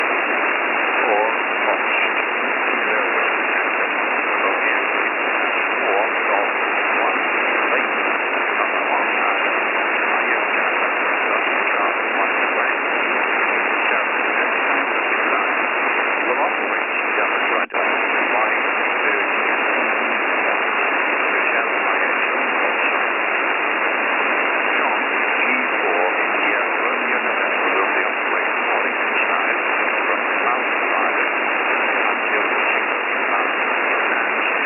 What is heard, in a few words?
Combined SSB